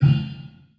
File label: tink; ambient, misc